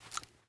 double shotgun insert shell; Rust

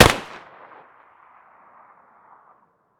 rsass fire far; Escape from Tarkov

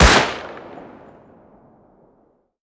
thompson attack; Rust

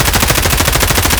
m4 fire outdoor loop; Escape from Tarkov

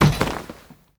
large banner deploy; Rust

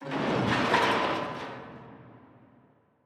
damage hits explosions; World Of Warships

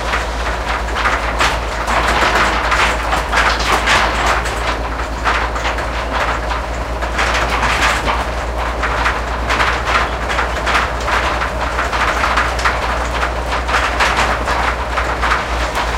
wheels speed 99, tanks; engines; War Thunder

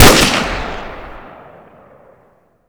dragunov, weapons; Modern Warfare

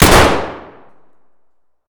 mosin indoor close; Escape from Tarkov

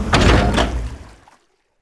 Ironclad Fort; Civ4 warlords

